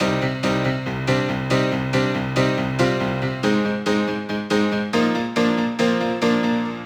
Piano, 140 BPM; Vengeance Samples; No Fear

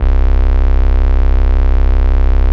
Basses C; Vengeance Samples

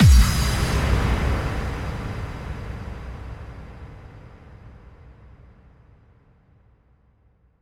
bassdrum reverb FX kick; Vengeance Samples

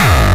Bassdrums Hard Kicks; Vengeance Samples

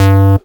Synths C; Vengeance Samples

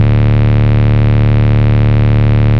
Bass D, Root D

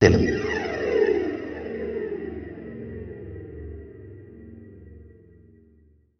Reverb Athmospheres, FX Vocals; Vengeance Samples

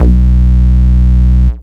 Root B, Ultimate Bass; Vengeance Samples

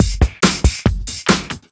Breakbeat; Vengeance Samples; VEC2 Loops

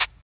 Closed Hihat, Cymbals; Closed Hihats

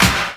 Snares & Claps; Vengeance Samples

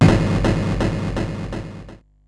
Arcade Riffs

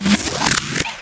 Scratchy, FX; Vengeance Samples

